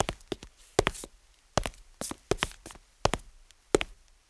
steps; Mafie 1 cz

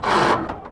gear; Mafie 1

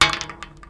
metal; Mafie 1

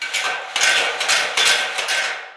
bum; Mafie 1

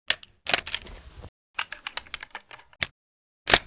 revolver; Mafie 1

